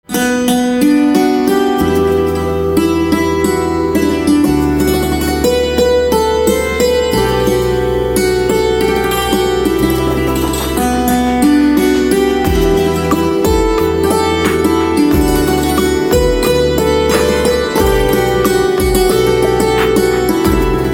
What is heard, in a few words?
Santoor Instrumental Ringtone